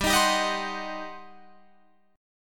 G Major 7th Suspended 4th Sharp 5th, G, down, Guitar, Open F; GM7sus4#5; strummed